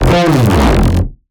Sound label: Phase Plant, bass